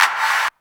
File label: GATED CLAP; DR.ACCESSOR